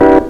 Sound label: ORGAN; SoundFactory